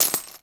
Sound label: coins bag